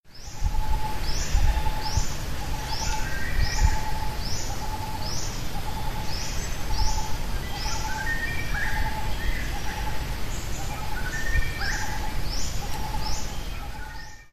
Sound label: angkor jungle